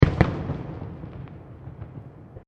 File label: Fireworks Multiple Explosions; Fireworks (Sound Effects:Backgrounds)